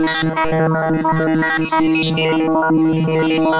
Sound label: 133 sample and hold robot; VOCAL OR VOXY LOOPS